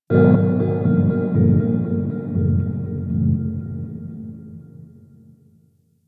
Clan Dojo Misc, Ambience; Warframe; Dojo Randoms

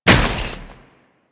Combined Step Snow, Footsteps; Enemies; Warframe

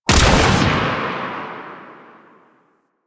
Sniper Rifle Fire; Warframe; Veilbreaker; Venkra

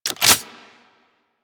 Crossbow Reload Eject, weapons; Garuda

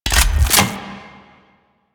Spear Gun Reload; Weapons; Warframe; Tenno; Hoplite

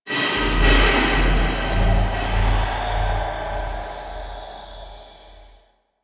Intrusive Echo; Ambience

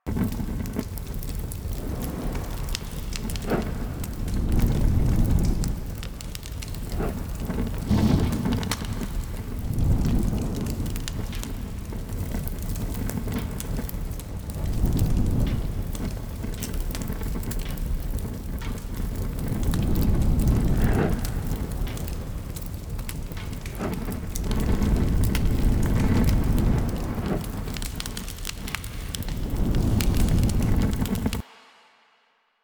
Car Fire Loop; Ambience; Props; Warframe; NineteenNinetyNine

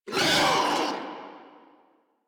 Infested Charger Death; Warframe

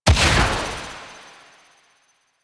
Fairy Soul Explosion; DE; Warframe

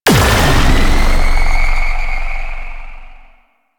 Octopede Oblivion Fire; Warframe; Man In The Wall

